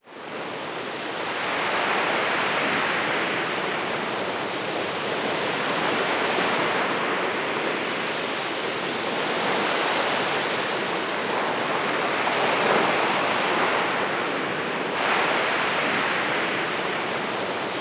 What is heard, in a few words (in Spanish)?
mar tranquilo